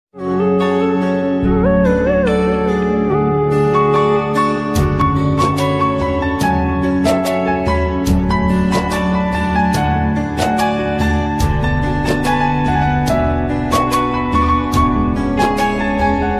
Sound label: instrumental bgm ringtone